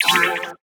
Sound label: Retro FX; Cymatics; Video Game Sample Pack Vol 1